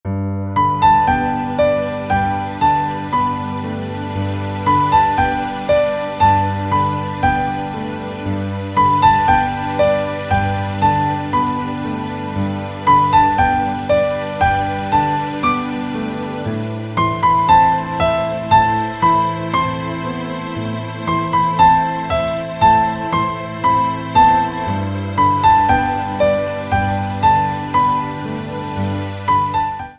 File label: soft piano ringtone; Muzica Usoara; ton de apel